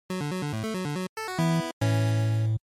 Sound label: NES General Miss